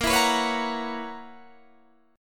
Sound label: Gb 11th Bb